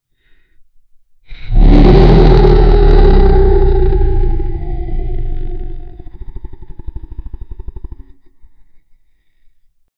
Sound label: the roar of a giant